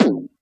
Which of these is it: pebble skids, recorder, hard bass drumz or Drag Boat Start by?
hard bass drumz